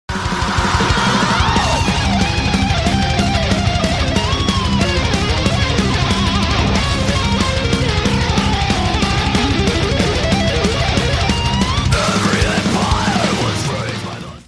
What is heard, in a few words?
Guitar Solo